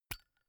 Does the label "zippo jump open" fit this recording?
Yes